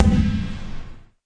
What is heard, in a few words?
yogaball, Big Rubber Yoga Ball